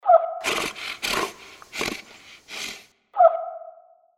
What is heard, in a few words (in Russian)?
Mul